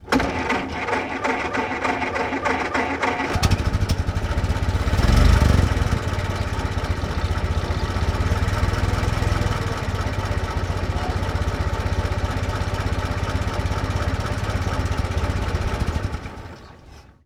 1924 Ford Stake Bed Truck Ext Crank Start Idle Revs Off; Period Vehicles